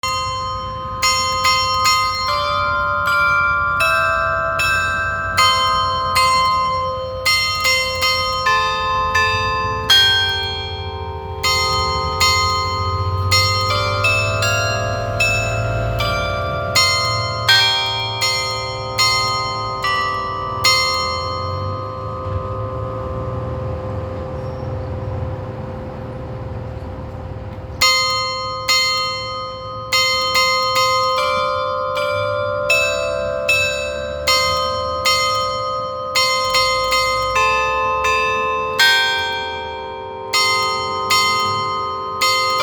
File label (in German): Glockenspiel 11 Uhr; Donauwörth; Stadt Donauwörth